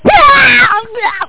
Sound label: Urgh; tkg episode; amiga